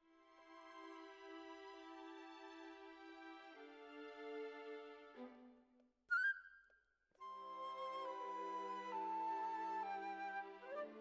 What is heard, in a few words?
Oboe